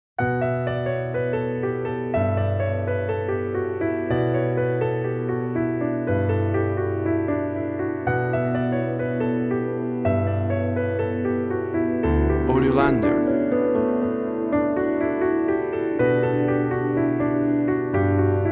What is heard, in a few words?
small light; psilva